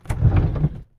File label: drawer open